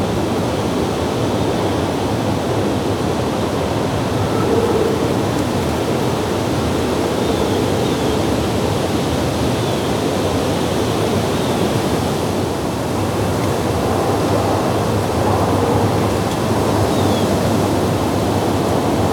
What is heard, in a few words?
Forest Wind Loopable; ps4 ps5 switch windows xbox one; Xel; gamerip 2022